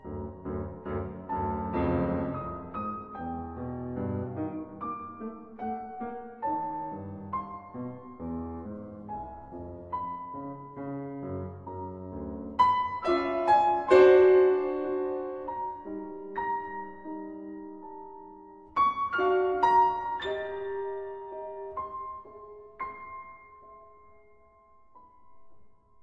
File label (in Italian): Studi dodecafonici Andantino